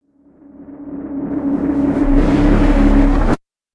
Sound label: Startup Wipe Wipe; Ninja Tracks